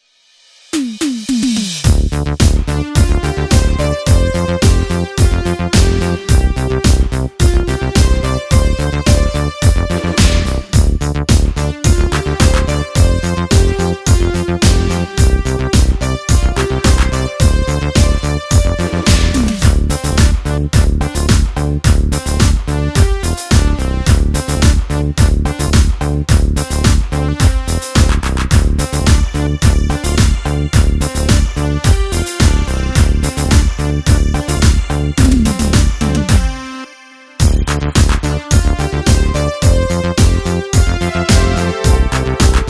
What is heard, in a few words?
Production Music 80s POP